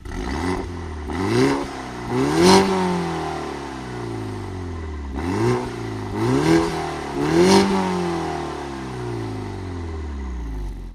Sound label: Mini JCW 2,0l 170kW Closed